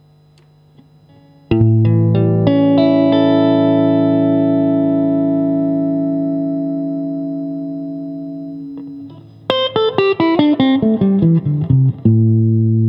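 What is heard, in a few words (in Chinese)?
60S OCC 单晶铜 清音 2档, acoustic guitar; kindman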